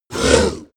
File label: ogre attack; kreaturen